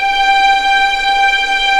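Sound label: G5A legato, violins; East West; The Ultimate String Collection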